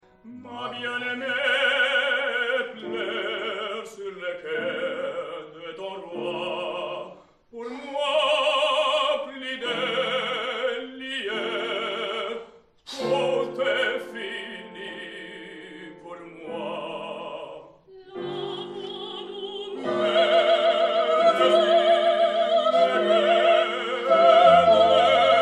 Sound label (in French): duo triste; roi reine